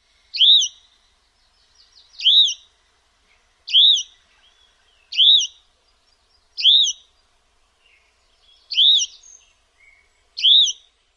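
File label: song, bird; CA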